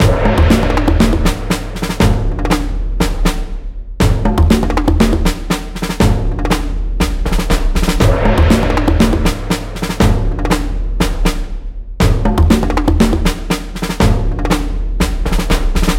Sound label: Death March - 120BPM, Percussion Kits; Ghosthack; SkyRealm